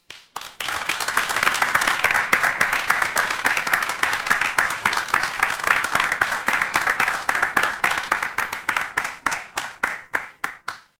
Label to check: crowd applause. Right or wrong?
Right